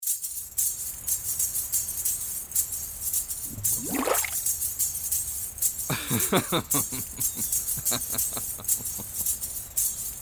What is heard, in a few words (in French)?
SANS VOIX